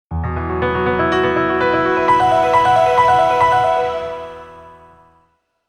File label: Crescendo, ringtones; Sony Ericsson T650